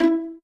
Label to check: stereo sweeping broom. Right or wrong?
Wrong